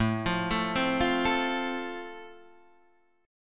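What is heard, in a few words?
A Minor Sharp 5th, Guitar Standard, chord strum; Am#5 arpeggiated; 5,8,7,5,6,5; JGuitar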